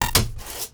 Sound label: Swan Vespa; Zero-G; Total Drum Bass; Hits and Bits